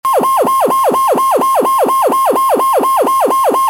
whoop

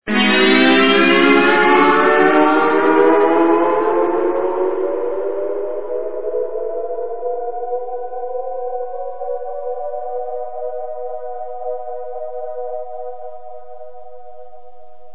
takeoff

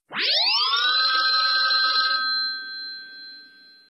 Warped Ring, Ringtones

